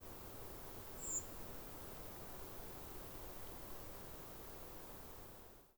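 short, Redwing Turdus iliacus, A nocturnal flight call at the shorter end of the variation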